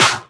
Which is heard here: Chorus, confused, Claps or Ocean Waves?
Claps